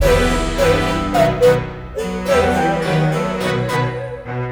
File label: classic, ringtones; Google; NewWave Labs